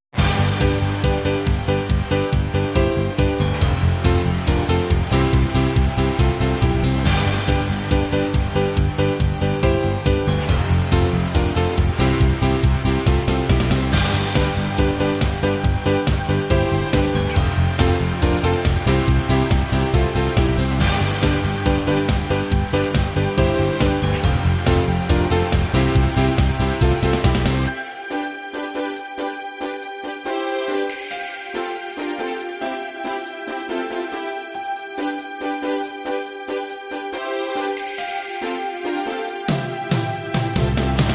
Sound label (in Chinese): 宣传片背景音乐; 3分以内